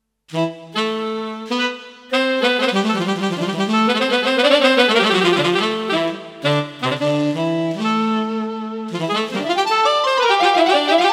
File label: sax warmup; Short sax warmup